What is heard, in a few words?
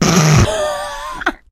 laughter, survivor, voice; source engine; left 4 dead 2; producer